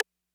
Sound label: Rimshot Accent, 808